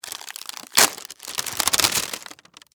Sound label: Package Open Wrap, Foley, Package, Open, Wrapper; Plastic Sound Effects; Plastic (Sound Effects:Materials); BlastWave